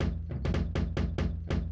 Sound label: initiator rhythm